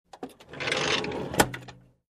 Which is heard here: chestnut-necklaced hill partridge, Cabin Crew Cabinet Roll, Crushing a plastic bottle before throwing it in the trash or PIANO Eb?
Cabin Crew Cabinet Roll